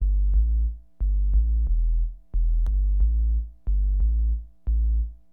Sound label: LO BASS, HipHop; LiveAct Pool